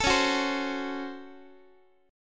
Db Major 7th Suspended 4th Sharp 5th Db down Guitar; x,x,12,11,10,11; DbM7sus4#5 strummed; JGuitar